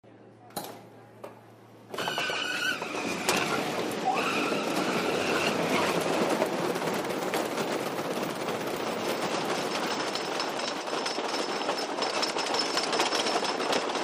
Ride; Roller Coaster Onboard Sequence, Start To Finish, Some Voices.